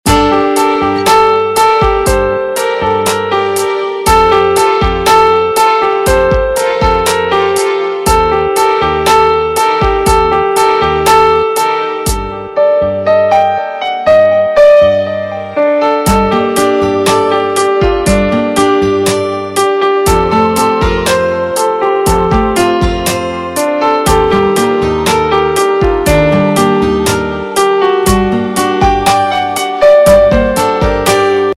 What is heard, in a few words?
Instrumental Ringtone; Bollywood Hits (2014)